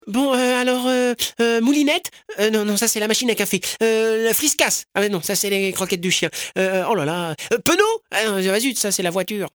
bruit; MOBICLIC 72

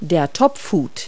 Cloche; Multi-Lingual Talking Picture Dictionary

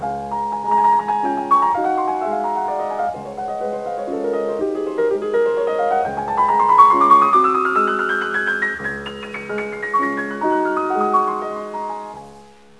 berceuse

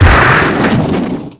boum

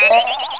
CHTOING

